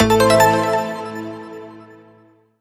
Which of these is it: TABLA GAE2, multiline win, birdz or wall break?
multiline win